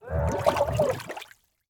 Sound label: Liquid Water Scream Under Water Short